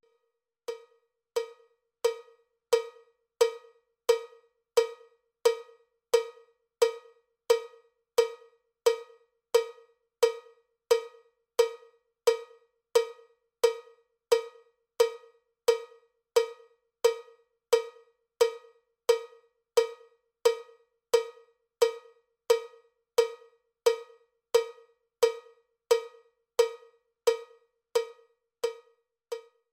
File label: cowbell click track bpm 79; cowbell click track bpm 88